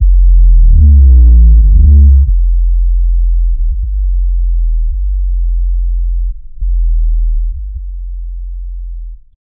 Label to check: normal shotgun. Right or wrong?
Wrong